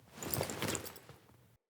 Foley Action Default Polearm Wind Up, Clothing; Player

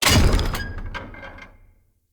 Chop Entrance Impact; Sinecure

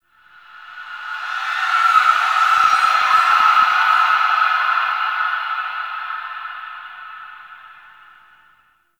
Memory Echoes, Ambience; Zariman; Warframe; The New War

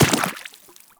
Step Water, Footsteps; Warframe; Teshin

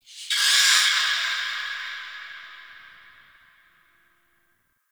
Ext Creature Distant High; Misc Outdoor

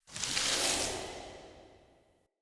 Idol Mode In, Powers; Fey; Champion

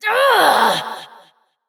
Evil Twin Doppelganger Frustration

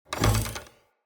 Bow Shot Twang; Josalfar; Weapons

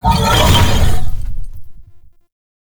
Horse Glide Wing Flap Flight Boost; Warframe; Duviri Horse; Vehicles

